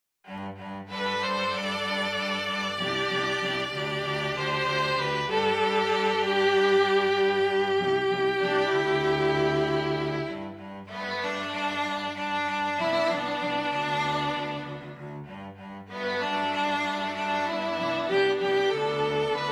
STRING QUARTET

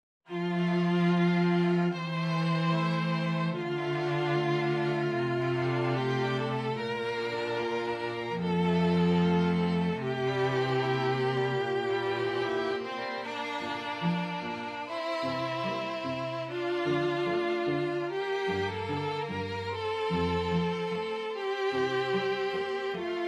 CELLO PART Quintet

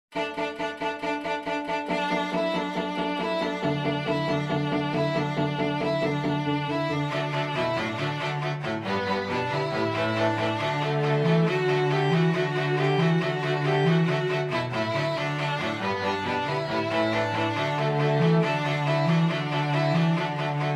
STRING QUARTET

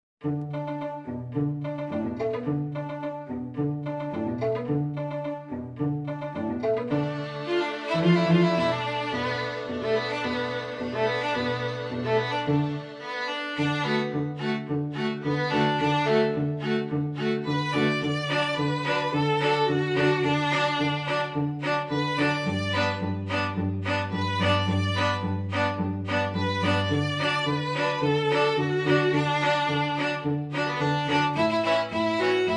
STRING QUINTET

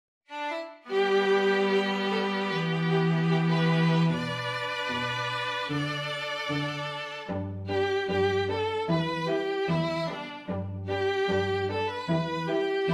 STRING QUARTET

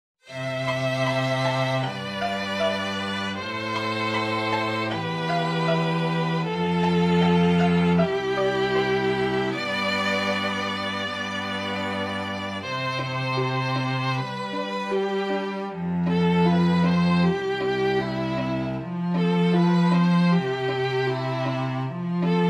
STRING ORCHESTRA; CONTEMPORARY – POPULAR- JAZZ STANDARDS

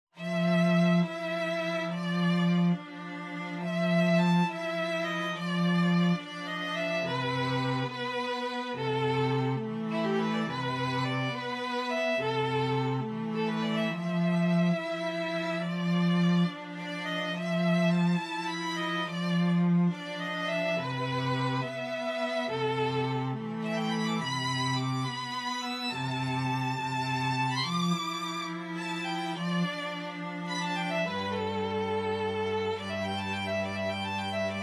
STRING DUO

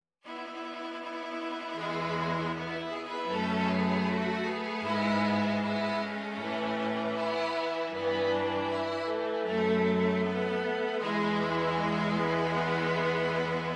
STRING QUINTET

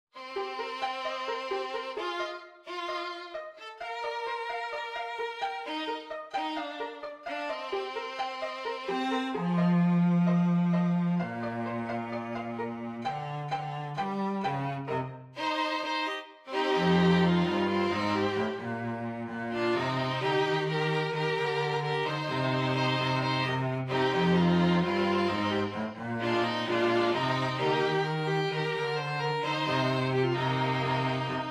STRING TRIO

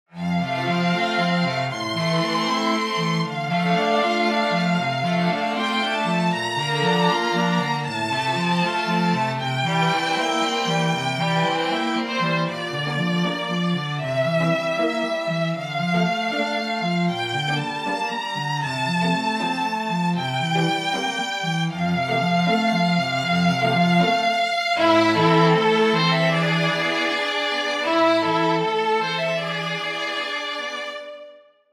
Quartet

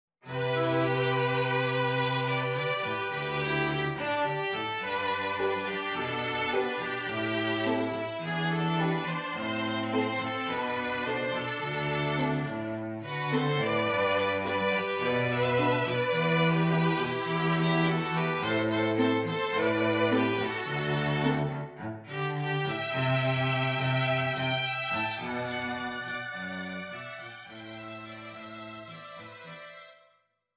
STRING QUINTET

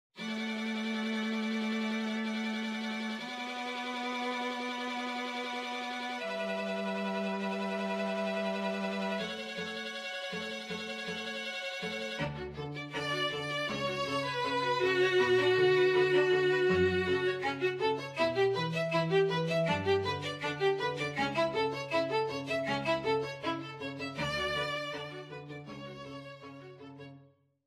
STRING TRIO

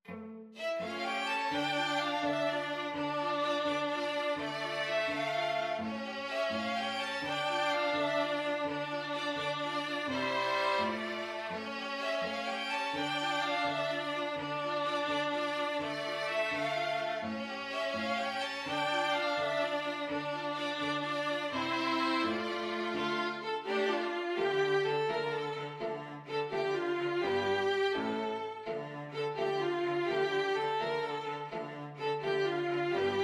STRING QUINTET; persian iranian iraqi

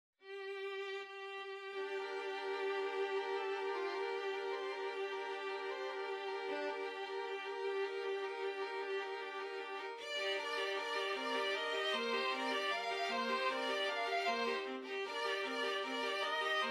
STRING QUARTET

